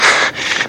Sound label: Fatigue Hard; 1916 Der Unbekannte Krieg